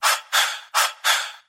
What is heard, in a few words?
breath